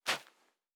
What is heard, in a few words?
Footstep Sand Walking; Character Sounds